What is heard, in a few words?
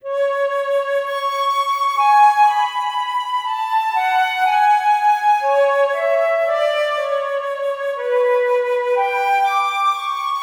Solo Flute; флейта